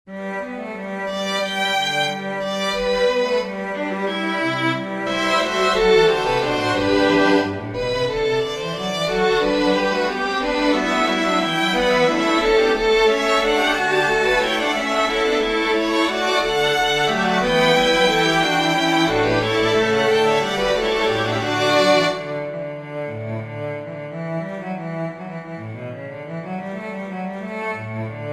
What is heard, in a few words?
recitative for string quartet; Johann Sebastian Bach; was lust